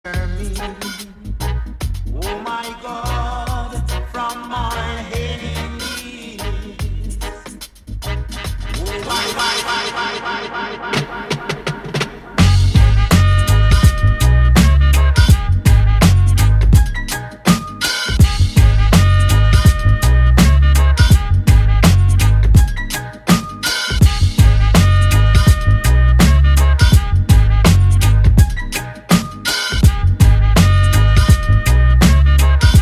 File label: Instrumental Riddim